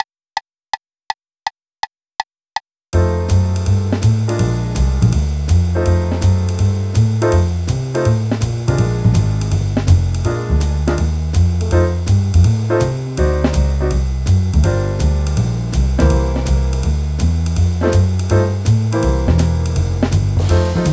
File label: Blues 2 chos 164bpm